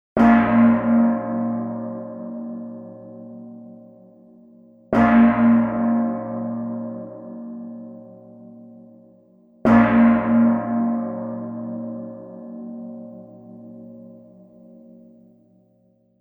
gong in d three times

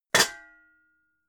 pots hit hard

